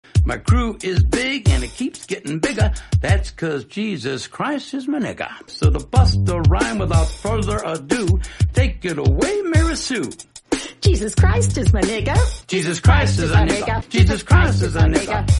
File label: hindi; jesus christ